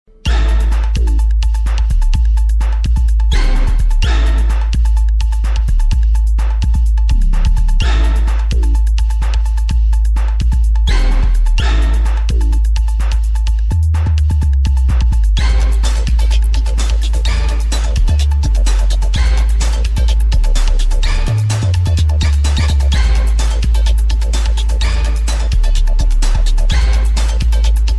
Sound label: full bass music